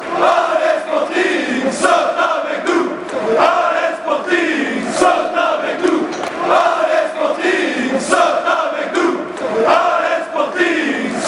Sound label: jump with us, chants